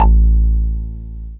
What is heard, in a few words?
bass techno